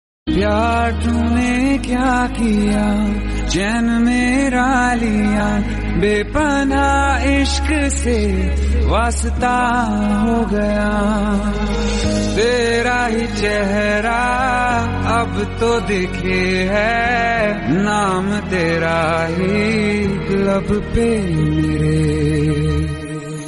hindi sad

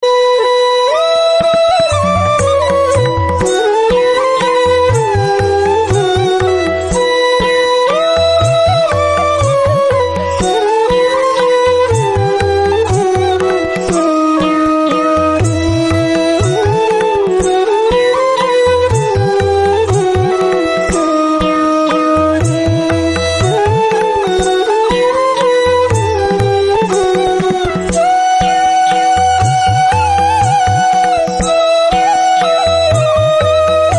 Dashain dhun fusion; malashree dhoon

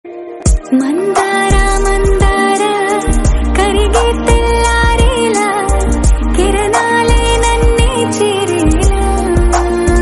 telugu song; bhaagamathie

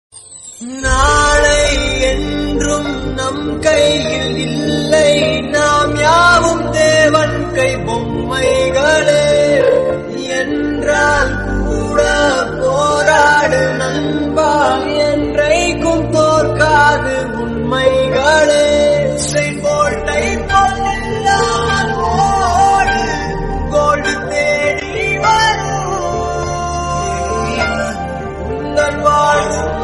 slowed, tamil

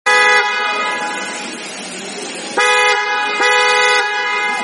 audi car horn sound

